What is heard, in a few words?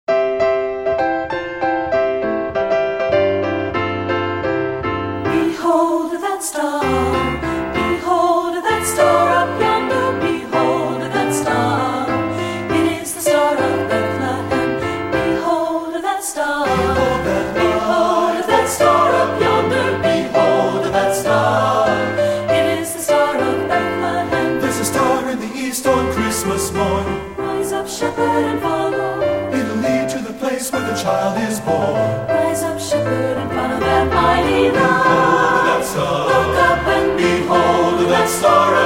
SATB